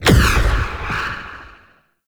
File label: Spirit Proj Impact; Characters; Enemy; Mockeries; Abilities; SubBoss; Mockery